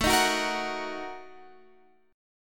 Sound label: Bb Major 7th Suspended 4th Sharp 5th, guitar